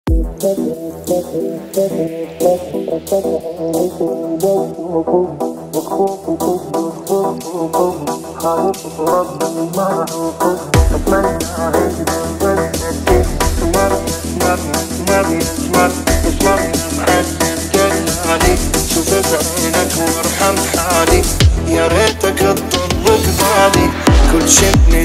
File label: arabic remix